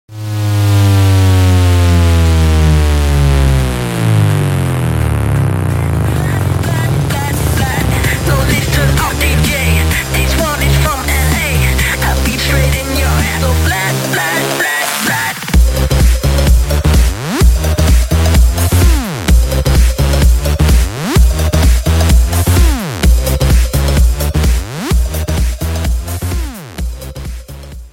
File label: Dj Remix